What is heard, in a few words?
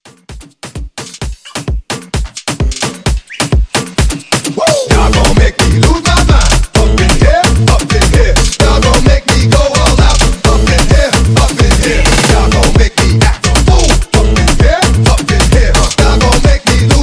Afro Hype Bootleg